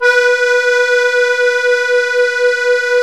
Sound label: KEY, Concertina; Club-50 - Foundations Roland; Accordians